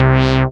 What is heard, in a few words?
SYN SH, Roland; Sound & Vision - Gigapack I CD 2